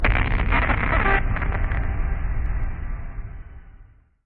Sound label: static